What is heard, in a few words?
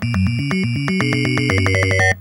Game start music; qbert